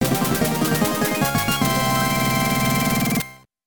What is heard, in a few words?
boss beat; Boss Defeated; ボンバーマン'93 スペシャルバージョン